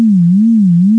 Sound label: Pulse; Asterax